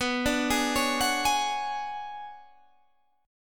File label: B Minor 6th Add 9th B down Guitar Open G